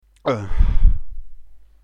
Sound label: urghhhheeer